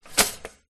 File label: household toaster double press down